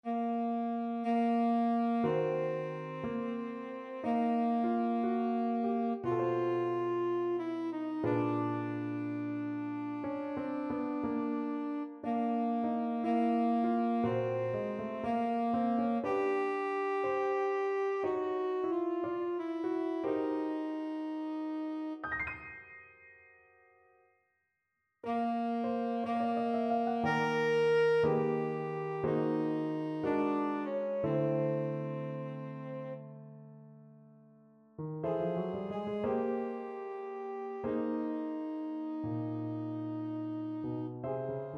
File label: tenor saxophone